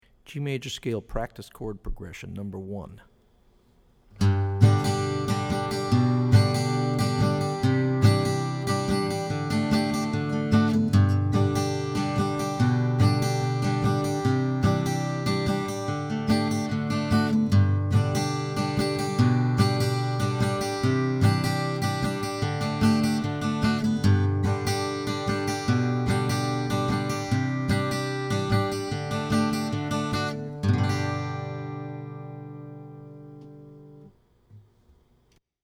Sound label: G Maj Scale Prctce Chrd Prog; lead skills materials